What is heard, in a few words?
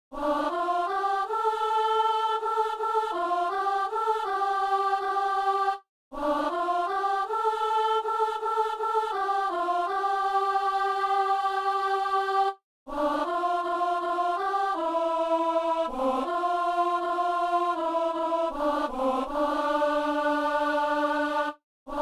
soprano